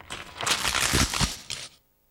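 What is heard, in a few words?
bone crack; E-MU Producer Series Vol. 3 – Hollywood Sound Effects; Science Fiction; Pumpkin Head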